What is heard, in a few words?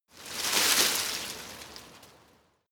scrub small branches hit; haus-garten